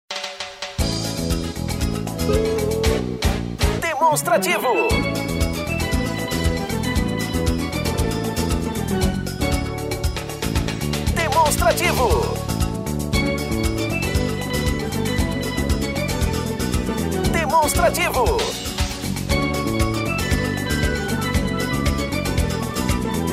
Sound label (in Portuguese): tema espera